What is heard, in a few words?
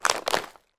food snack mix pack pick up from table